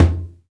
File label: PRC CNGALO, AFRICAN PERC; Roland LA